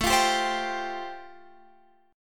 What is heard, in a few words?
A-Minor 7th Sharp 5th, chord; x,12,15,12,13,15; strummed; Am7#5